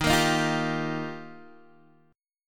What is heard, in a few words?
Eb Major Add 9th Eb down Guitar DADGAD